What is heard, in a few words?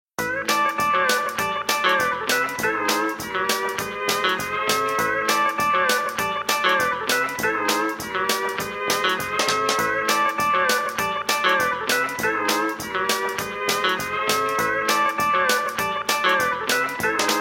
gun shop music